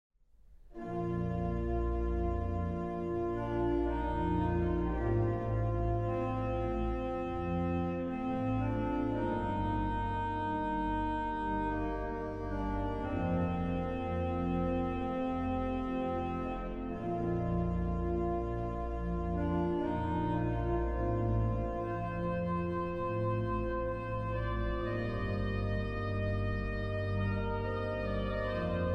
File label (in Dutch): orgelkoraal psalm 116; Psalmzang vanuit Elburg